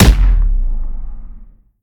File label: pwr hit; smite; gilgamesh